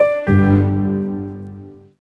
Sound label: Disconnect; msntv2